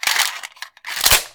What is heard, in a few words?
Gun Reload Weapon, Weapons Foley; SFX Kit